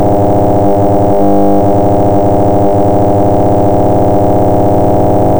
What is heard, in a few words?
Electronic Noise